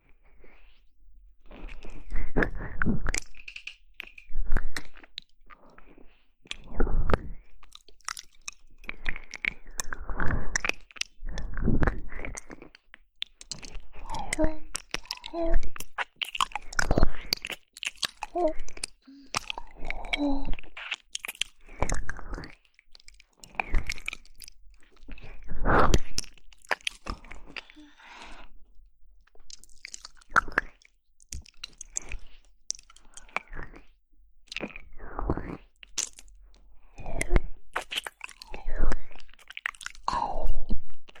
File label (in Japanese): お姉さんの耳舐めASMR